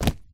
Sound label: tile floor jump